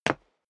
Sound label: step dress boots wood parquet single stair up; schritte; menschen